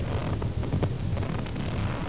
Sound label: swing; Quake; PainKeep; zombie